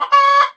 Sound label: death; quake2; chicken